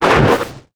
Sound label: Percussive Hit, contact mic